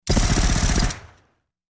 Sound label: Silenced Machine Gun